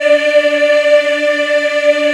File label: D4, Choir Acoustic & Synth; USB Soundscan vol.28; AKAI